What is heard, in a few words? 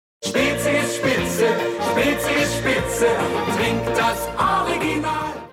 jingle